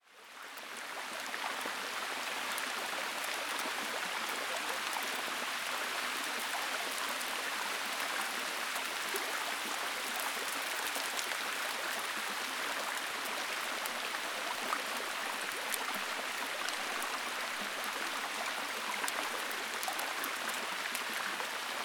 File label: Rain on forest stream; Regnvejr og vandløb i skoven, 30 minutter